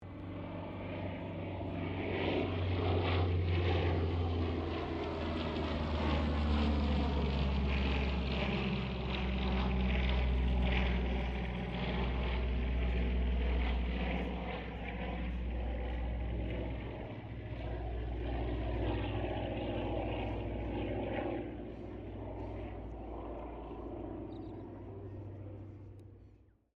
AIRCRAFT LOCKHEED HUDSON PASS, Hudson twin engine light bomber; 1941; Airplanes; Vehicles